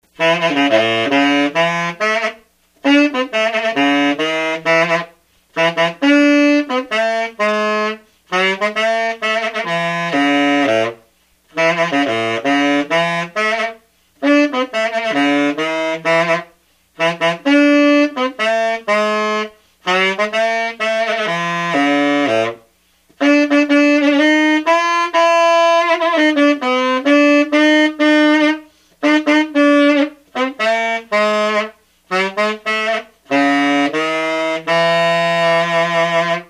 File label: Buescher 400 Tenor